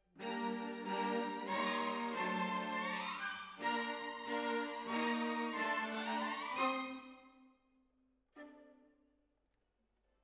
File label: Decca, Jazz for piano